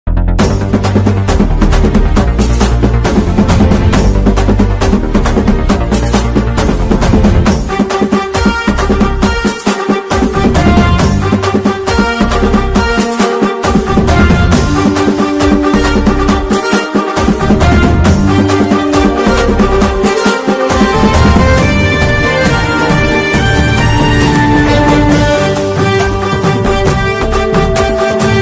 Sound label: tamil bgm; Mersal